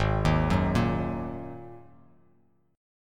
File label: Ab 7th Suspended 2nd Ab; Ab7sus2 arpeggiated; 4,6,4,3